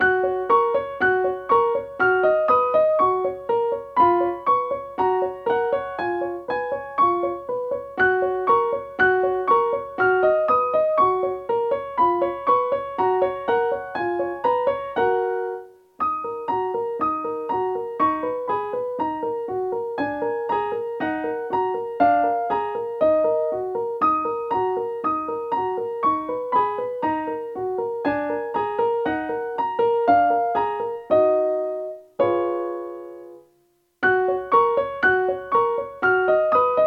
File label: swiss music box